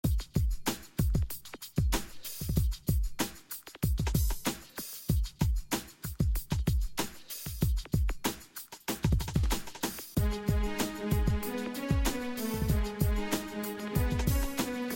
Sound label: music dance oriental; oriental trip